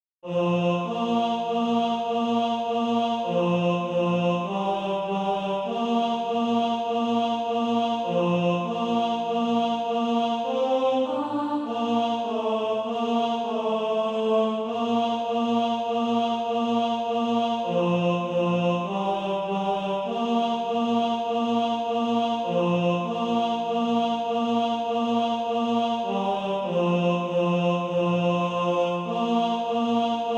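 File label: ten, Practise Music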